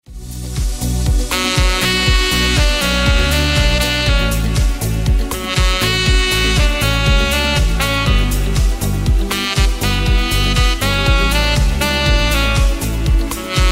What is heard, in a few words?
sax cover